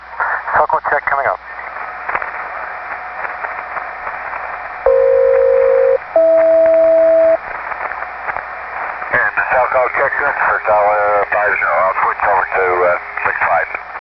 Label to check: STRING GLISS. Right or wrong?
Wrong